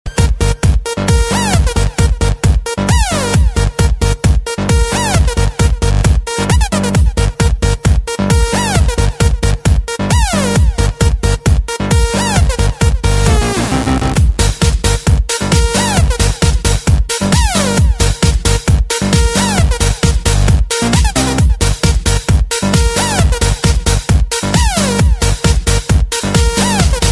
Bass Effect

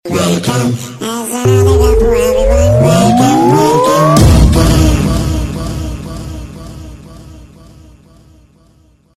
Welcome - Sms Tone

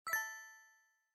Message Alert; MeetUp

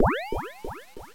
Earthquake

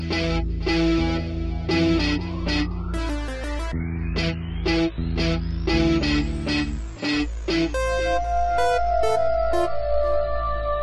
Rockin

